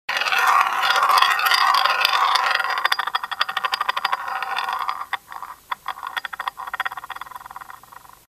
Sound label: spinning roulette wheel